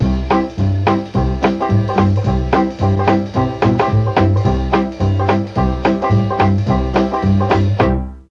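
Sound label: E2 dixie trombone, mellotron; DIXIE+TRMBN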